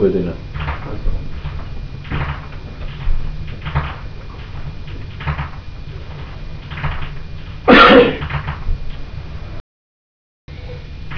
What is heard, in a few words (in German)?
schnalzen, jugend; Gois